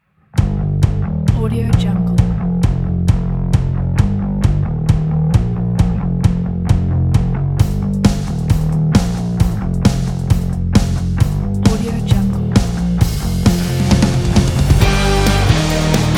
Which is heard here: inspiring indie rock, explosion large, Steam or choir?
inspiring indie rock